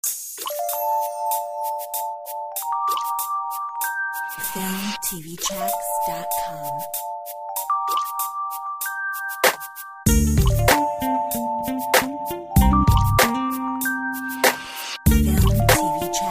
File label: Urban touch